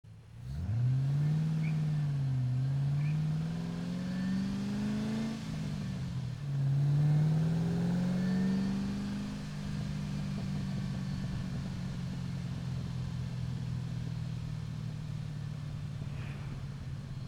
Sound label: mustang